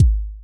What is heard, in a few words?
vintage electro kick with subtle distortion